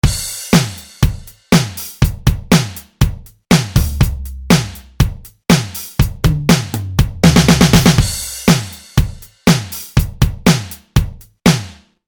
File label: HR16 Loop